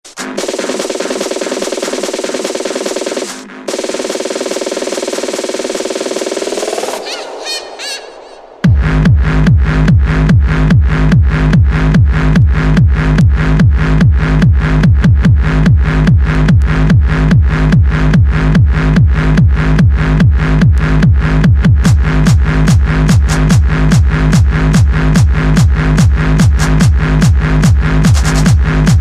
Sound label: Techno Mix